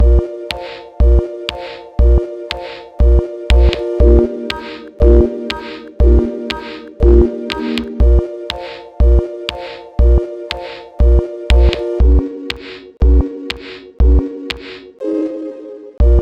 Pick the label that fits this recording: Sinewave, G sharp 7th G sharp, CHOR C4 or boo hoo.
Sinewave